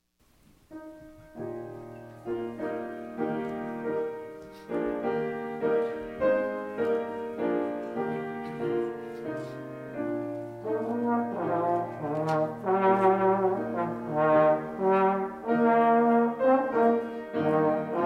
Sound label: at the cross trombone